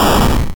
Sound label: Explosion 8 Bit